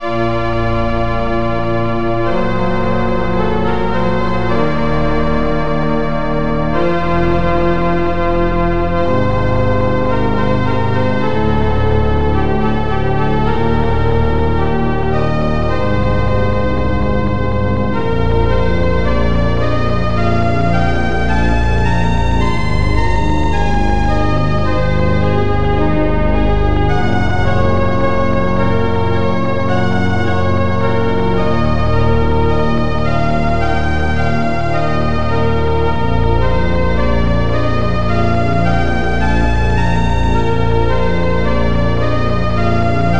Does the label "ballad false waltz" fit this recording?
Yes